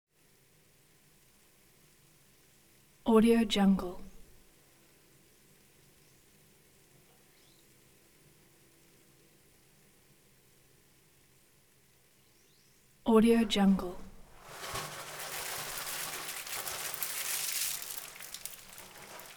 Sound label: acid sizzle; prosoundfx